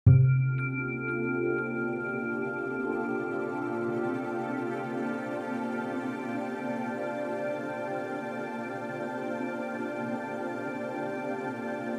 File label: ambient